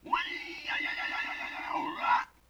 LoFi Synthetic Squawk; Vintage Cartoon; Comedy Vocals

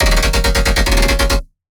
Melodic Bass D# 140, One-Shots Bass Hits; Ghosthack; Color Bass Essentials

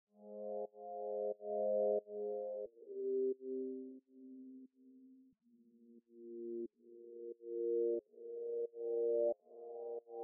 90 BPM C Min Texture; Melody; RnB; Loop Stems; Cymatics; 2022 Melody Collection - RnB Pt 1; Deep Love

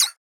Perc; One-Shots; Drums; Percussion; Ghosthack; Color Bass Essentials

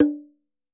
Double Bongo - D; percussion; Cymatics - Infinity Beta Pack 2.0; Zeus Percussion Collection

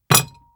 Ceramic Cup Hard Down; Fairlight Sound Lib

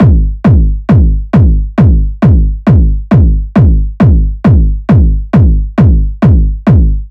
Kick Loop 135, Techno Drums

